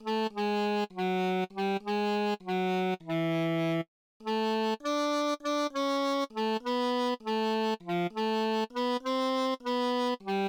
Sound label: Bari, barbershop tags